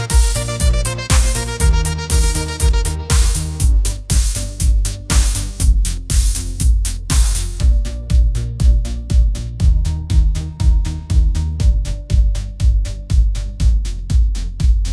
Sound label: upbeat pop melody, ringtones